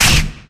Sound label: door malfunction close